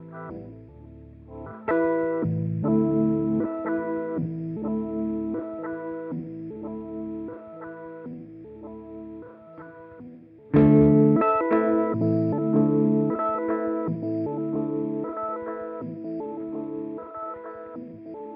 Thermae Bent Sequence; Chase Bliss Audio

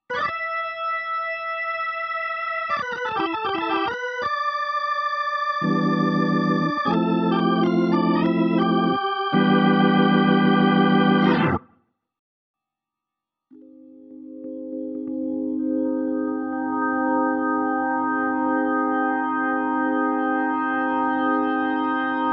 Nord C2D B3

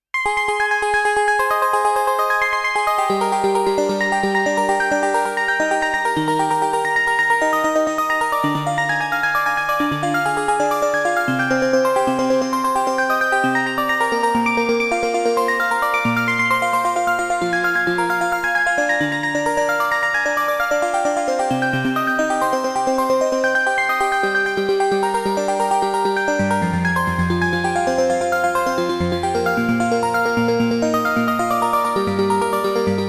Bell Arp; Super Gemini

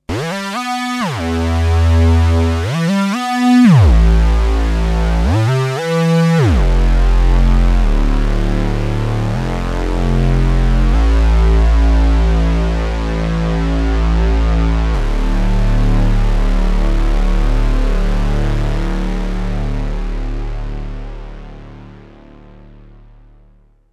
OB unisono

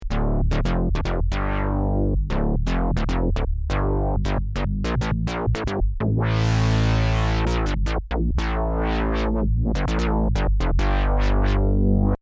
UDO Super 6 Punchy Bass

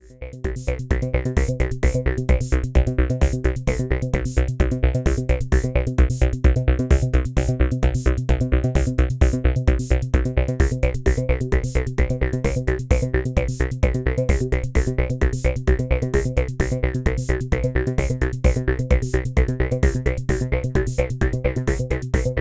System 500 572 Phaser

